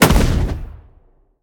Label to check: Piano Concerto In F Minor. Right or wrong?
Wrong